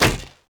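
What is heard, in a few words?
weapon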